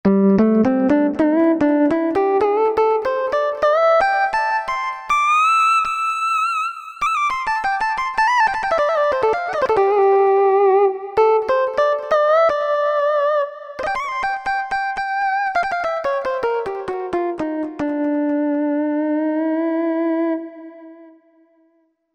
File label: Clk Solo Syn